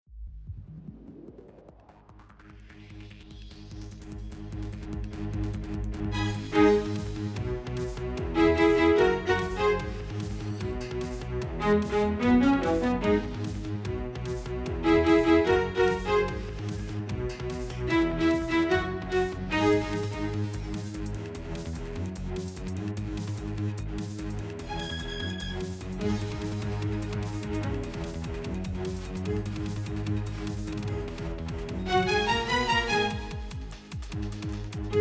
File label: Orchestral